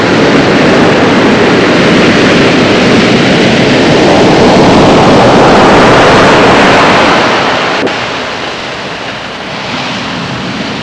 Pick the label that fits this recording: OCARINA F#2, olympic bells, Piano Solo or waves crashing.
waves crashing